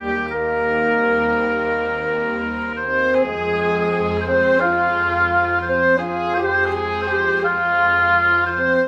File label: for orchestra oboa and basson